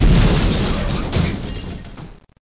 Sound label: bat hit; deep worm